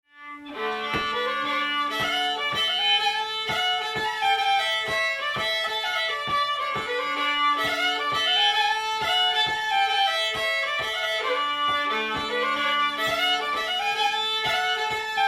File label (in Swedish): Polska